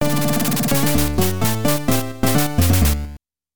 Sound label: boss clear